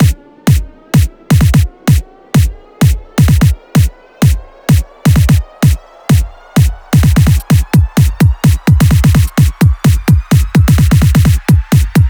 128 BPM; 16Bars; The One; Vengeance Samples; House Electro Fills

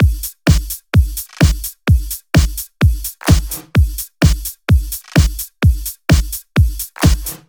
Housebeats 128BPM; Vengeance Samples

